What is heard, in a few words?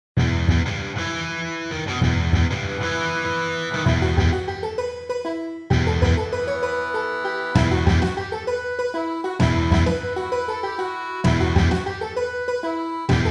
Irish Rock; Contemporary Music Composer